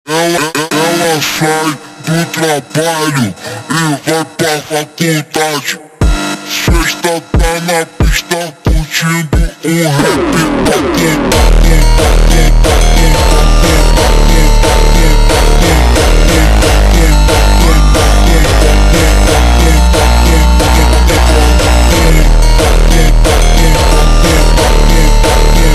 hardstyle Remix super Slowed

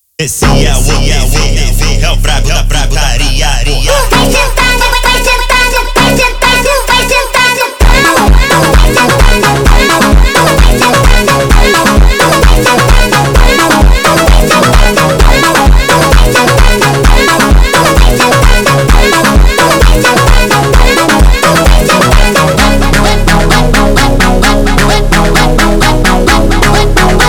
Montagem

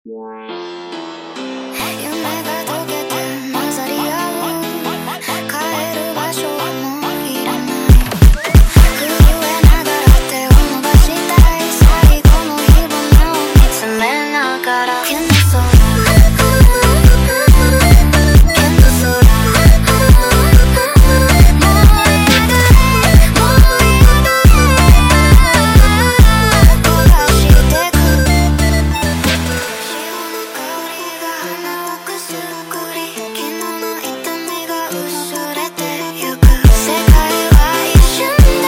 Montagem sped Up

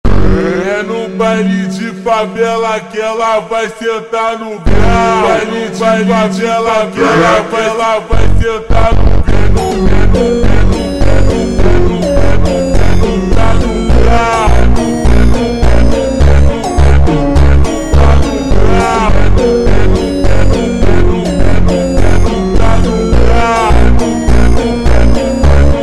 Montagem super Slowed

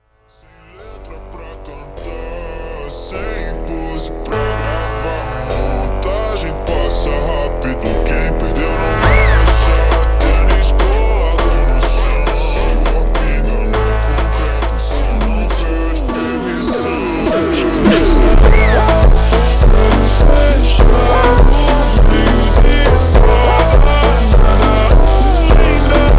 Montagem Jumpstyle super Slowed

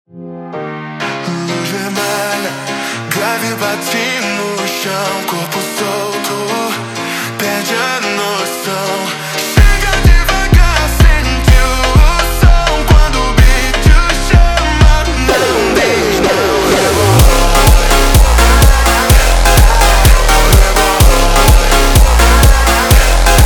Montagem slowed

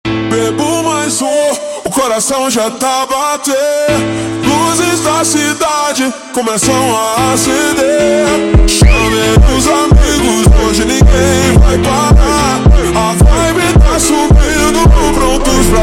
Montagem ultra Slowed

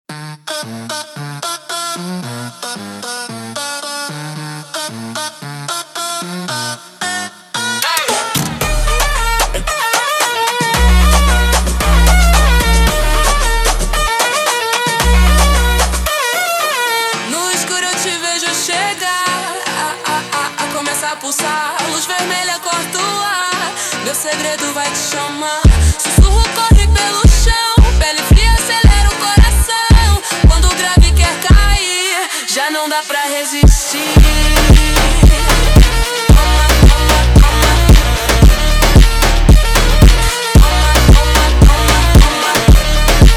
Montagem slowed

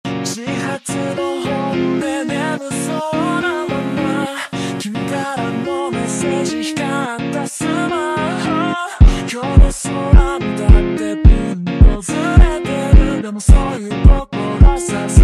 Montagem super Slowed

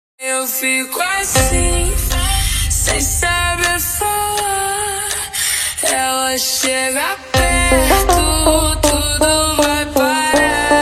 Montagem Slowed

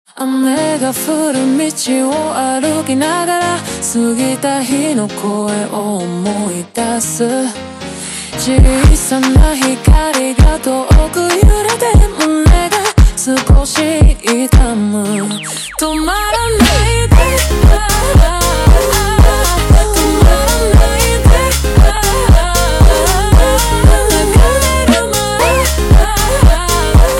Montagem super Slowed

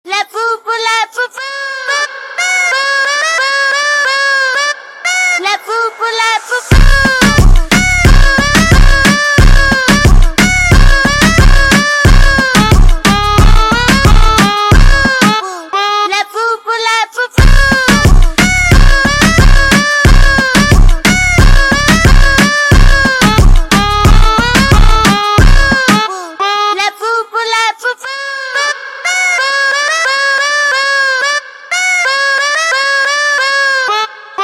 Reggaeton slowed

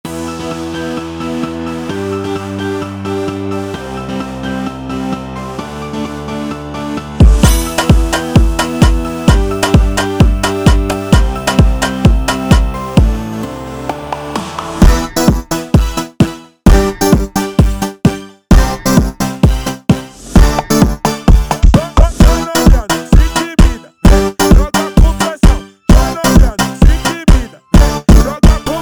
Funk

